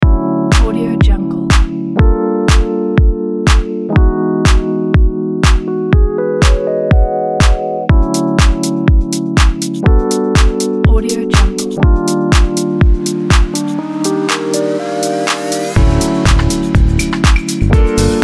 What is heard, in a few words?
the house lounge